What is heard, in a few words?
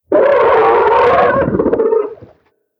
attack; TIGERTRESHER